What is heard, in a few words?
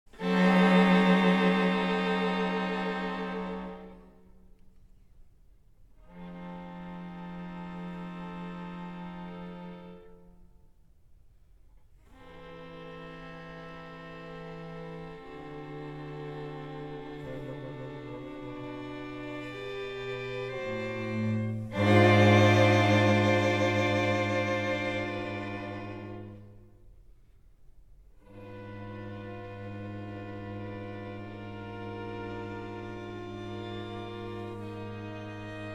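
String Quartet in C major; Beethoven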